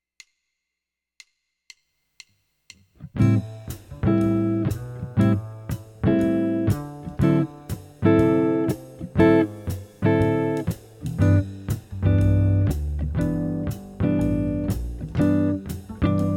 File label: easy jazz guitar chords backing track